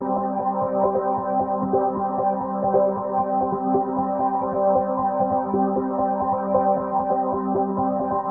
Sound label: melody